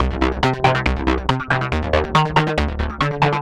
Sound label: synth 140 disco C Eb D; Transmission-X